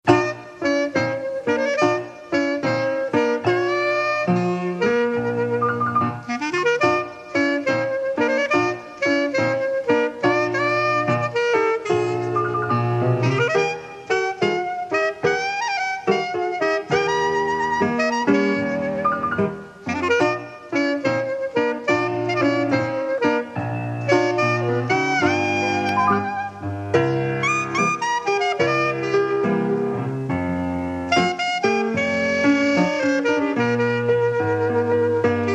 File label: blues